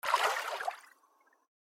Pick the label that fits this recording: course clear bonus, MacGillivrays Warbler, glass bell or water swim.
water swim